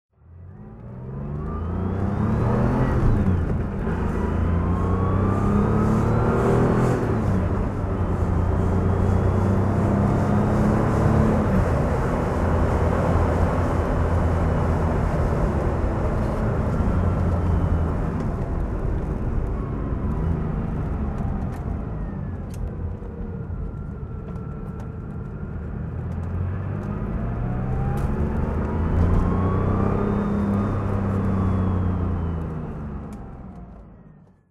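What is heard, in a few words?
porsche carrera coupe driving, engine sound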